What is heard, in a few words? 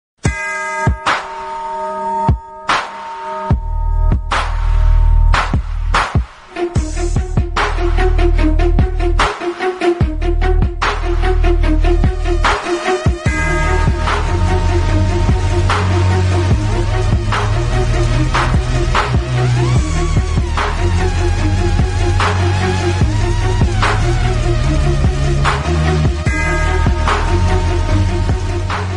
rap beat